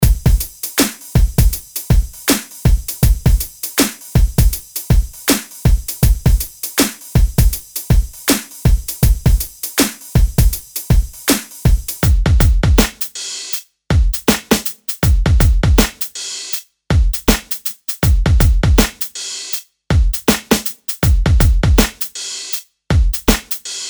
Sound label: Hip Hop; BHK Samples